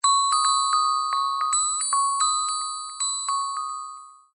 Bells, ringtones; Magic

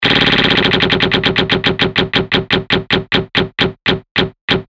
roulette; LG

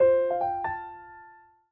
Piano, notifications; Honor X7C

